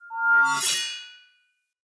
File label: Short Sword Whoosh Heavy Accent; Weapons; Player